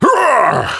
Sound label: hit